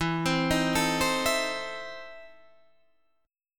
E Major 7th Suspended 4th Sharp 5th, Guitar, Standard; EM7sus4#5 arpeggiated